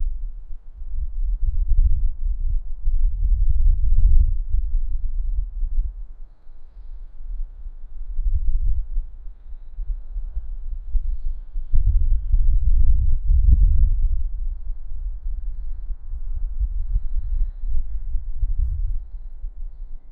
dubai park background, horn

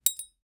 knife

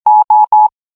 message being sent on a

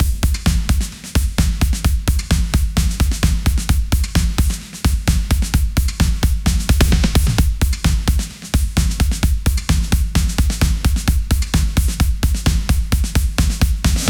powerful drums

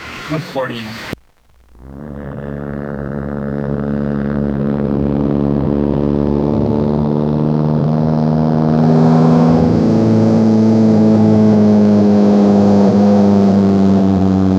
a deep loud growly 4 cyli

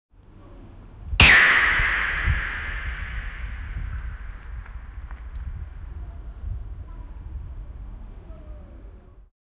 a powerful soccer shot

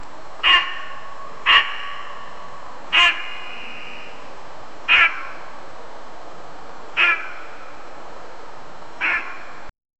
a very sharp sound is, deer

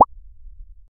soft bubble pop sfx low

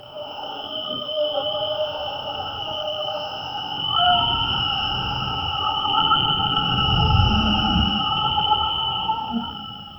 sound of a cheetah with, mammal

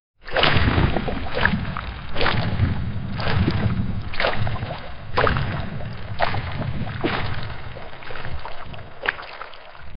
monster heavy single foo, footstep

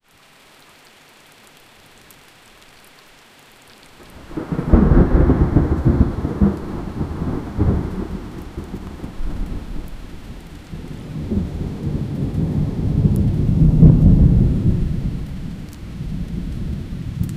thunder with isolated rai; weather

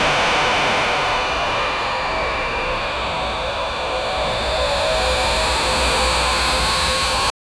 digital drag sound

